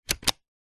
industrial battery power tool insert into charging dock; ryobi